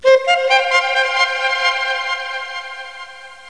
Sax echo; Soldiers 2

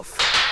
Open program; Desktop Studio 2000; JapAnime

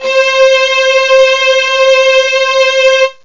viola; Sounds Terrific II; Weird Science; strings

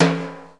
boom

